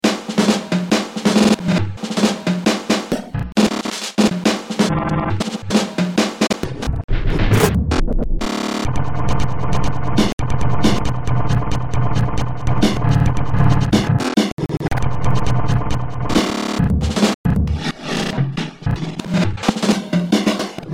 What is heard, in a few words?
drumroll; drums divided